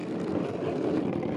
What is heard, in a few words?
Wood Plank Scrape Smooth Loop